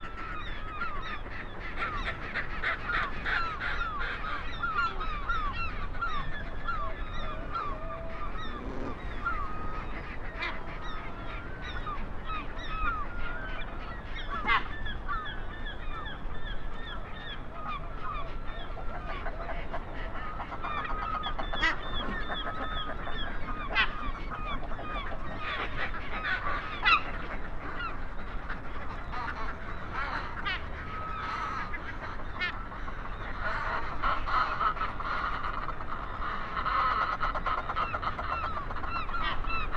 Northern Fulmar

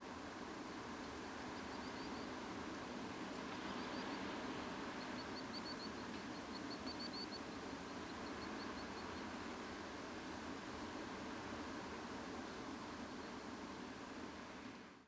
Common Sands one singing; Portland

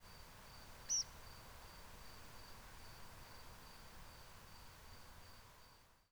nfc short

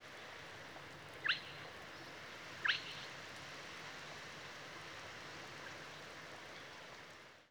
migrant calls

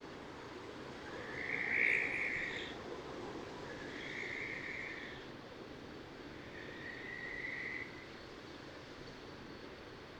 Madeira Barn Owl Courtship screeching of male

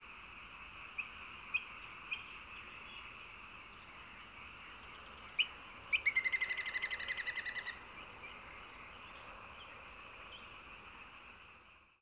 trill nfc

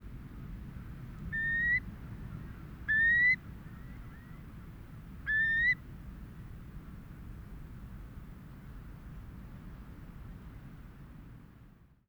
long low

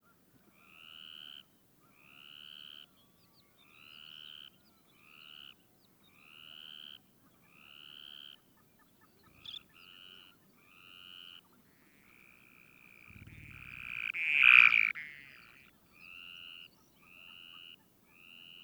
song briefly right over

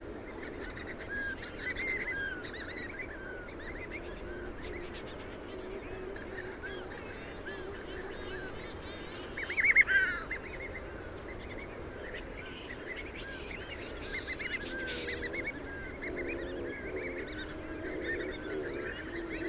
Barolos Shearwater

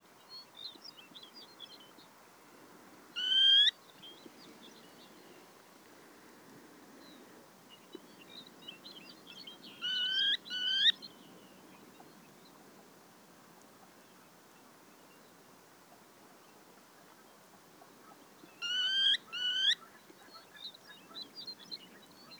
alarms

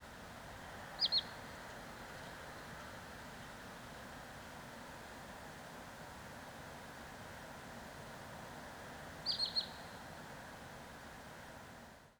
Wood Sandpiper

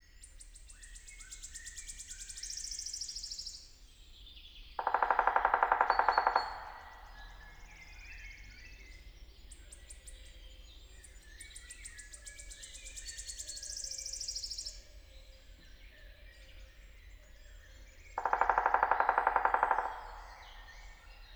drumming

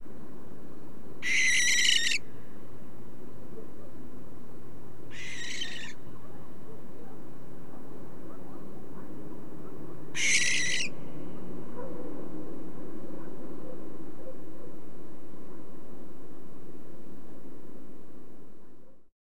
Madeira Barn Owl Mobbing screams of adult

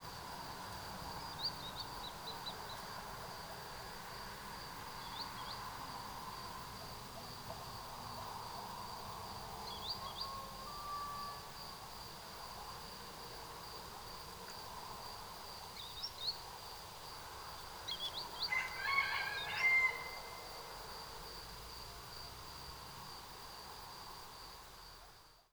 pit pit pit

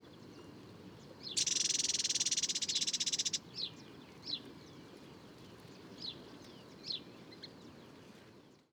rattle

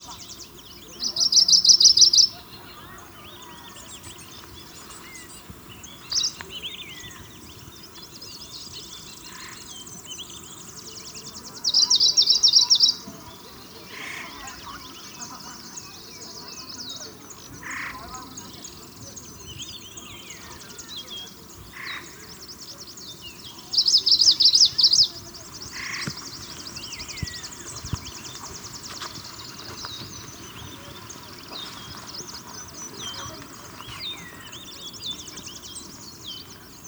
trochiloides call song

